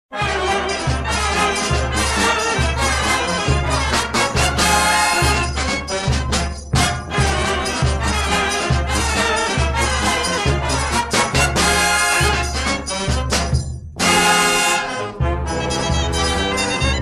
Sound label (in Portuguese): Frevo